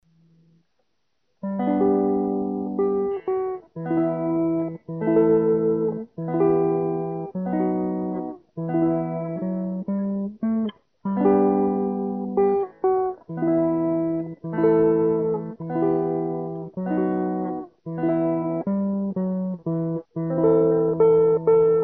Nyzhnij holos Bandurka Ukulele Electric; Aileen SG Style

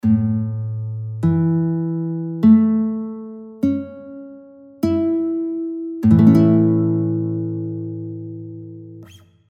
Bandurka A-strij Akord A E A C# E; Акорд звичайного строю – ля-мі-ля-до#-мі, AEAC#E

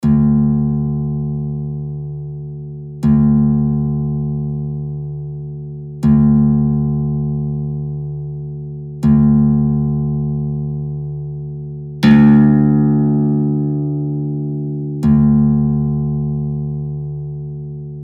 Hitara 7 str G strij D2; 7-ма струна – ре, D; Класичний”, або звичайний стрій бандурки

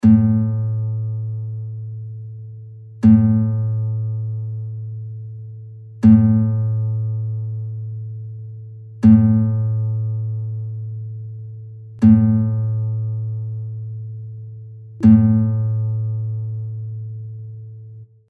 Bandurka A strij A2; 5-та струна – ля, A; бандурки

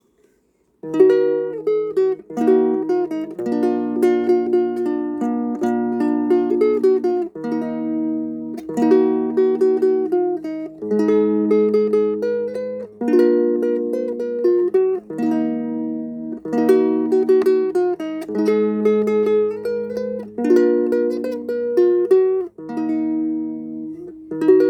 5str Yamaha GL1; bandurka

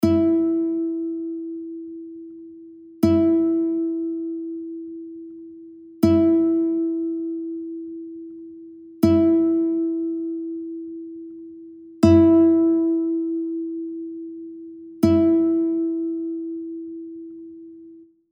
Bandurka A strij E4; бандурки

